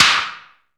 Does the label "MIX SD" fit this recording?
Yes